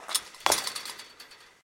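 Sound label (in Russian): light rattle of a spring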